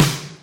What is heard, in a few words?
bushwick, snares real